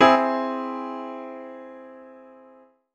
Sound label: C Augmented Chord